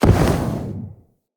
Enter Aim Glide, Foley; Tenno

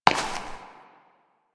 Step Ice; Warframe; Koumei

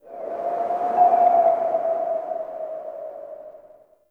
Gas City Distant Wind Textures

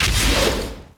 Disappear Whoosh; Infested; Zealoid Prelate

